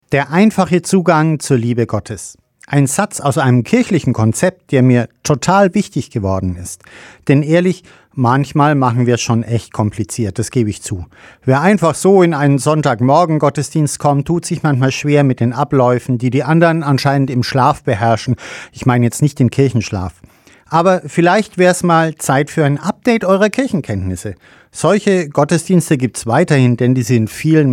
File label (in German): gong